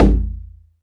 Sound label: DM5 Perc, Alesis DM5 drum machine